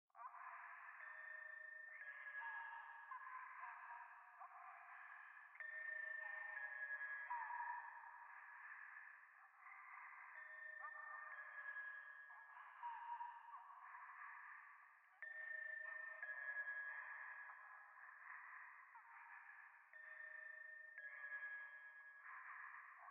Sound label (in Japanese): ゴースト 右耳密着処女喘ぎ 神社